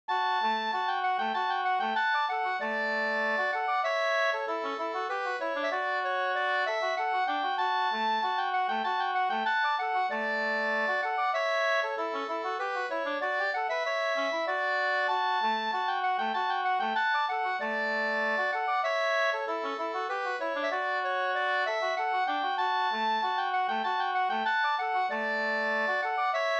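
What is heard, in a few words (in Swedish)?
slangpolska, two-part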